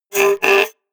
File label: scratch